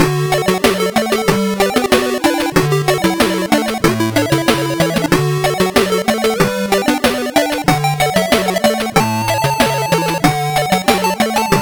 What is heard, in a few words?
Level Start; Lethal Weapon 3; Master System